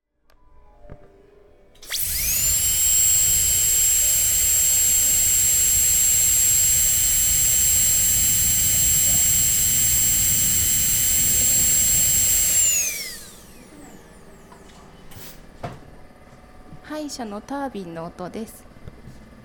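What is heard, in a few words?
dentist's turbine; Chino Cultural Complex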